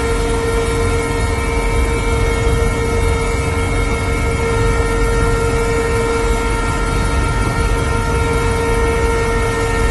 inside hover loop mono right, Lynx internal audio loops